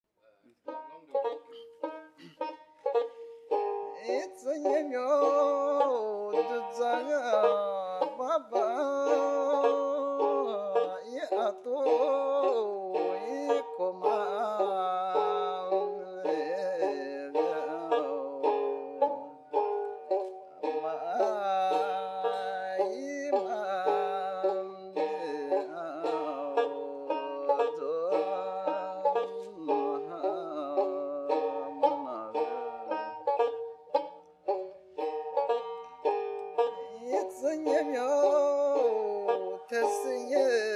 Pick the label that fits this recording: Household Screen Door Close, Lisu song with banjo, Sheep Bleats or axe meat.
Lisu song with banjo